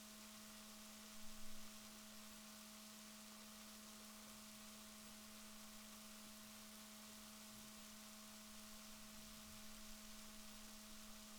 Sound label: rorquals negatif, Balaenoptera Physalus Chile